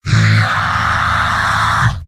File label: heavy battle cry; mvm